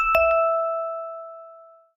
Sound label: Ring, notifications; Lenovo; ZUI 13